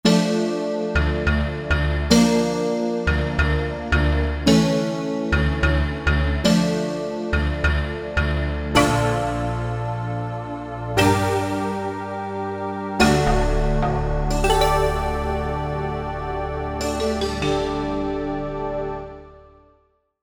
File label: Flash Pad